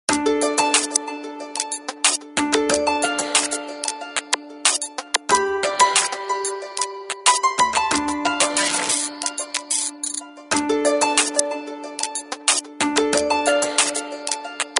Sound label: Harp Glitch; HTC HD7